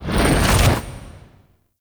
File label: Ghost Releport Out; Warframe; Priest Quest; Rell Ghost